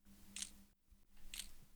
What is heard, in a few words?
Shutter Sound Android Phone Camera